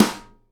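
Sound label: snare nylon damp, Live Snares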